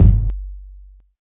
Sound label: deep kick sound g key, bass drum tuned to the G note. Loudest frequency: 95Hz